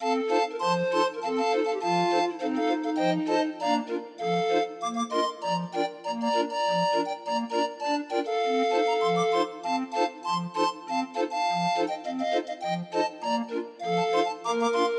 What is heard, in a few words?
Grind Organ; Arrangement by PK Watts and S Clarke